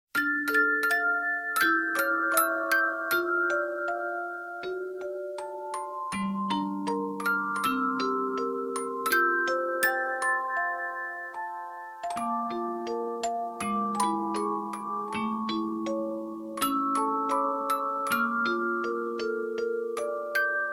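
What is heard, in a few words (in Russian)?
музыкальная шкатулка; orgol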